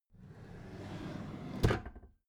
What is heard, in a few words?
packed drawer smooth clatter open soft